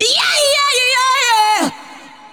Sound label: VOX, VOCALS; SoundFactory; SMPMANIA